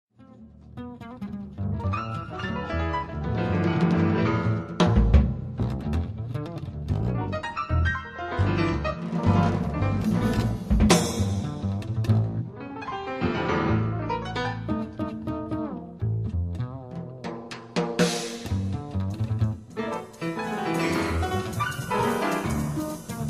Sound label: Wide Beat